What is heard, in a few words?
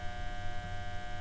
Light bulb hum